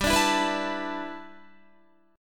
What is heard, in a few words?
G 6th Add 9th G Guitar, chord; G6add9 strummed